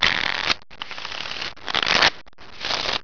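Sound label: shuffle; cassino; regal; casino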